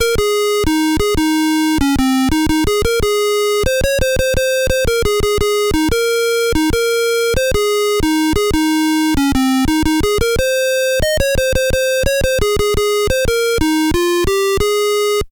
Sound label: theme